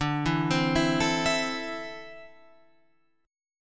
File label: D 7th Suspended 2nd down Guitar Open F; D7sus2 arpeggiated